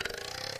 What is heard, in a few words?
ribbed scrape; There; ThereClient